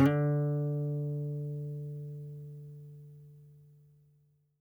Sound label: CLSCFGSLU PAD, Guitar; 90 s Sample CDs; Quantum Leap - Guitar & Bass